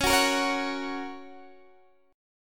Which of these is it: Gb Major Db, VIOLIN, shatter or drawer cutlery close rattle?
Gb Major Db